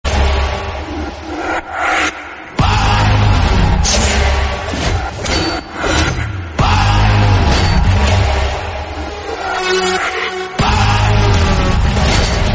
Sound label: trailer ringtone; bahubali